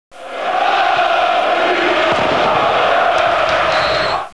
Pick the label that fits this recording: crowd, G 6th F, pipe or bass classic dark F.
crowd